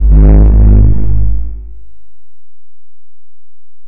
energy cubes; Scars FNC SFX

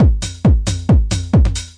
135 bpm hart house with toms

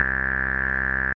filtery synth bass

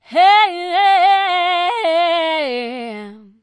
female melodic soul, female singing; VOCALS and SPOKEN WORD

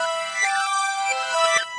133 backwards hard strings; SYNTH AND ELECTRONIC LOOPS

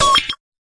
fx drum, Electro FX

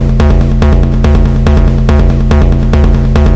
143 tribal banging, drum loops and breaks; 141 to 160 bpm

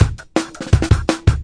165 live rollbreak; 161 to 180 bpm

